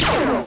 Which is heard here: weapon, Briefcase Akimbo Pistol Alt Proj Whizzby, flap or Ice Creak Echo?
weapon